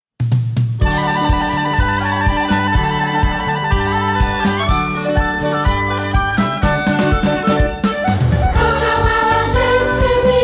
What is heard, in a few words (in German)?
Kookabura Chor